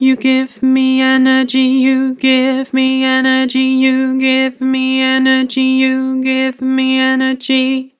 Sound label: Vibrato, Vocals; Give me energy; Harmor Vocals